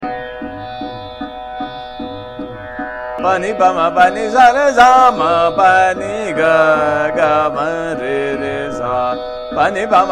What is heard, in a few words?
Sargam Pa Ni Pa Ma Pa Ni Sa Re Trital, Nayaki Kanada